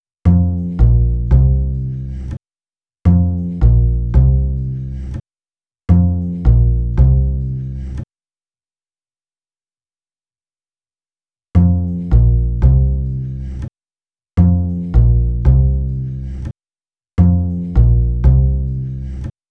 Bass, Hip-Hop

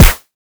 Kick, Drum Hits

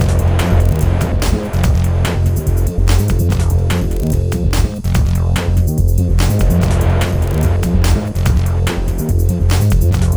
Hardcore Breakbeat, 145 Fmin

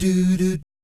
DOO DOOT, Vocal Planet Jazz

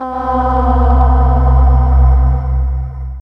Headless Housefly C, Pads; Total Drum&Bass; 90 s Sample CDs

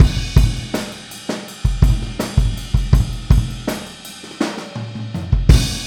Boogaloo Fill Kick, 82 BPM, Drums; Sonic Foundry (Sony Creative Software) - Drums from the Big Room The Mixes

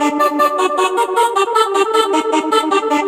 Synth Pat 155.5bpm, Keys; Jungle; Zero-G Jungle Warfare 1, 2 & 3; 90 s Sample CDs

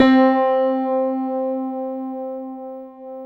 PIA MICRST, MICRO-PIANO, Roland; Sound & Vision - Gigapack II

